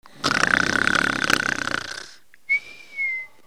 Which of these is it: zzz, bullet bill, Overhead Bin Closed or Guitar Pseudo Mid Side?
zzz